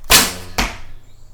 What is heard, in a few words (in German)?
Ton